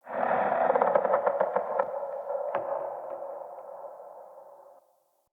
Trees Talk; Ambience; Corrupted Ode Three

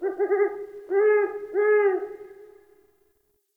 Forest Owl; Warframe; Grineer Forest

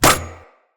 Rolling Drone Landing; Characters; Grineer

